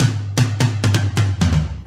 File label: Fill Ins 128BPM; Vengeance Samples; VEH2 Loops